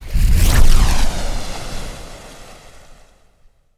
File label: Flush Big Wave